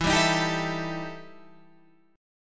E Minor Major 7th Double Flat 5th E Guitar Standard; strummed; JGuitar